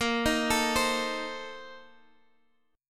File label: Bb Major 7th Suspended 2nd Suspended 4th, Guitar, Half Step Down